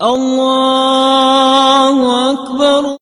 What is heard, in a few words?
allahu akbar song